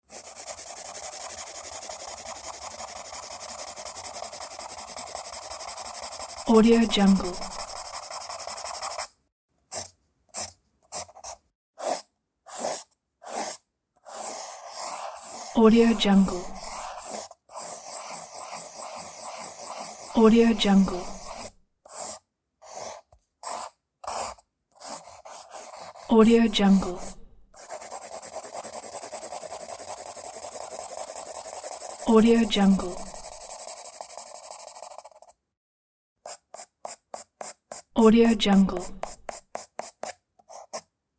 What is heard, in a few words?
pencil drawing